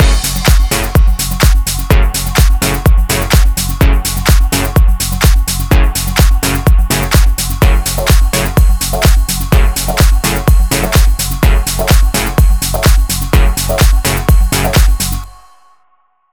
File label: 126BPM Mix; Vengeance Samples; Malta Kit